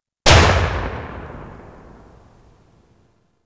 Double Barrel Shotgun Tail; Warframe; Weapons; Tenno

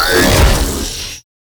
Spawn; Warframe; Sentients; Repeater

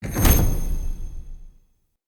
Sweetener Heavy Attack Stun; Weapon Impacts; Sweeteners

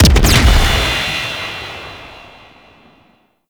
Jamming Drone Explosion; Warframe; Corpus; CorpusRailjack

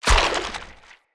Jump Mushroom Activate; Puzzles; BeanStalk

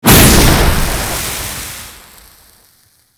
Explosive Arrow, Explosions; Warframe

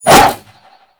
Arch Wing Sword Short Whoosh; Warframe

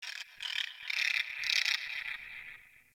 Venus Landscape Distant Birds, Ambience; Warframe; Venus Open World

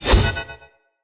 Throw, Swords; Weapons; Player; Mockery Weapon Common

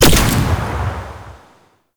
Shield Deactivate; Warframe; Nineteeen Ninety Nine; PvPvE

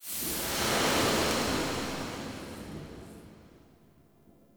Hand Projectile Smoke, Weapons; Warframe; Duviri

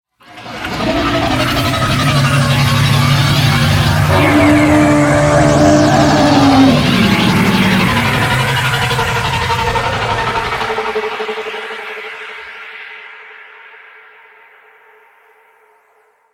Liset Level Intro Docking, Vehicles; Warframe; WM Liset

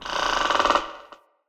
Tree Pine Branch Creak; Trees; Midrath Island; Ambience

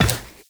Run Glass, Footsteps; Warframe; Tenno

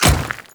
Grineer Royal Guard Dirt Step, Footsteps

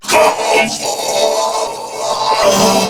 Death; Warframe; Corpus Crewmen; Crewman C; Dialog Barks Battle

